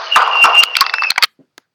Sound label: scream